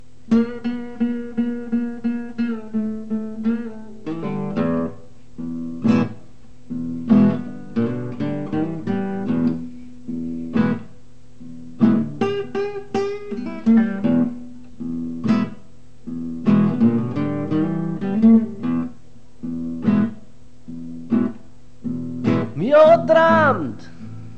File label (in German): traum blues; original mc digitalisiert 2014